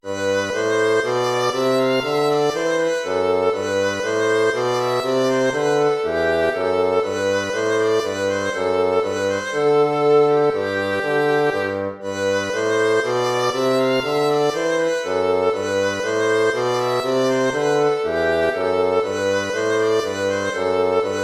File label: baroque minuet major